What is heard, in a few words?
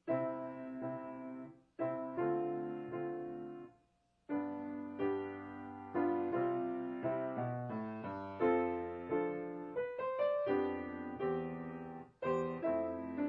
Larghetto, piano